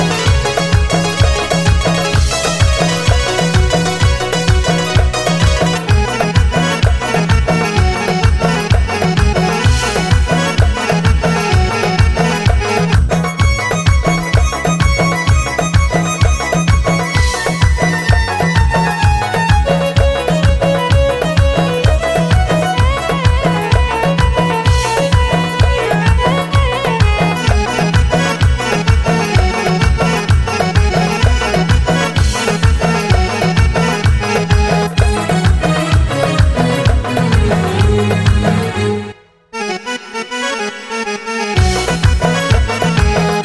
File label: Accordion